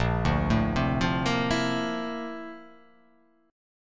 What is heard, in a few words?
Bb Major Sharp 11th Bb down, chord; arpeggiated; JGuitar